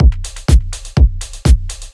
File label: drums house full b; drum loops; cognitive